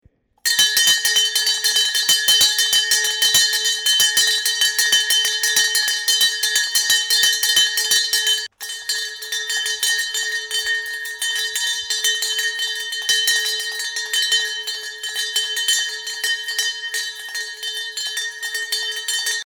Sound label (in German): wiener boerse glocke; presse